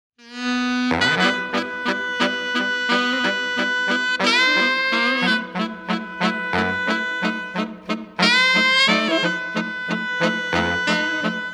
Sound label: Prelude in E Minor